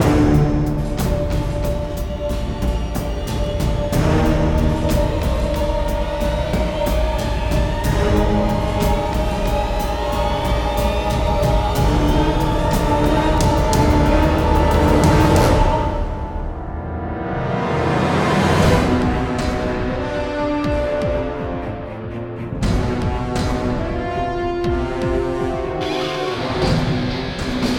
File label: Level Music